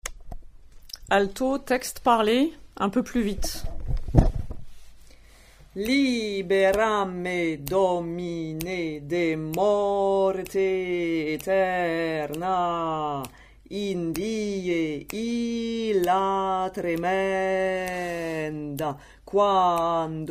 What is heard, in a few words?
alto Texte; libera me